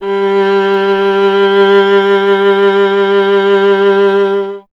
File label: viola G; Miroslav Vitous; Solo Instruments VOL-1